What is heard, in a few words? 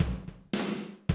Classic DrumLoops, 110